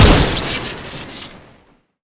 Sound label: relic machine fire crit, weapons; tf2classic